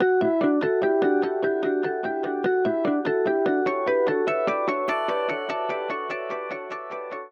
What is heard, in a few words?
system ringtones abstract